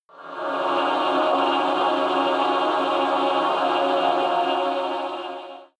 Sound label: evil choir chord dissonant high